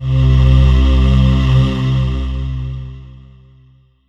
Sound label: Roland JD-990 Vox Pad C5